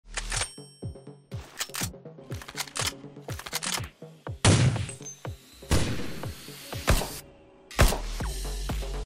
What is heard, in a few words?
Gun; بازی کانتر استرا